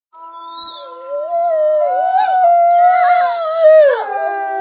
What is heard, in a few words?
wolf howl